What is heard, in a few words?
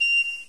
pipe